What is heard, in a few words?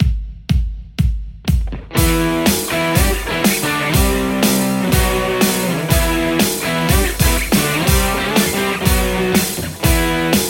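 Stratocaster crunch; aradaz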